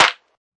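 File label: hand clap; Claps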